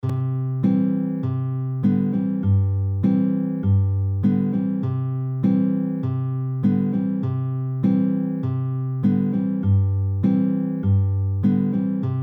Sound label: 3 Finger Pluck, fingerpicking